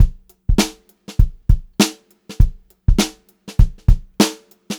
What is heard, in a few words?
BEAT, Funk-Groove Drumloops